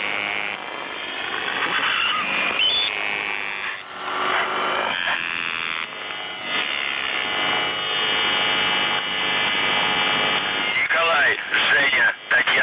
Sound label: buzzer usb